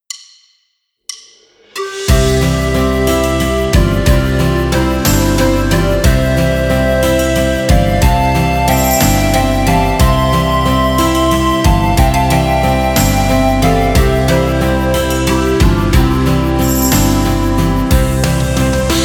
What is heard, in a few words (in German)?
Karaoke ohne Chor